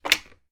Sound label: household telephone old reciever lift; sound effects one